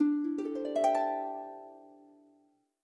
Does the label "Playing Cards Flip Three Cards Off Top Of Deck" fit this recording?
No